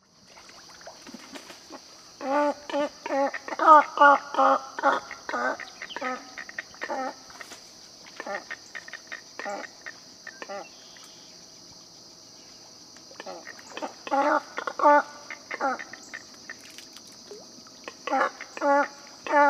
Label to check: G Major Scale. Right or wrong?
Wrong